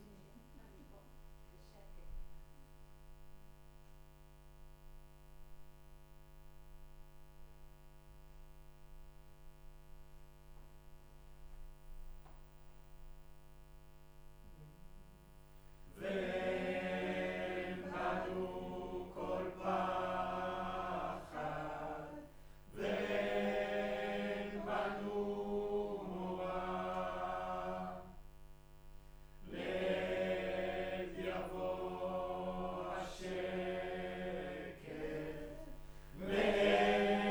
Room, Choir

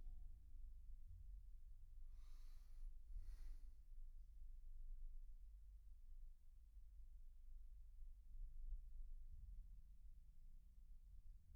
Kit

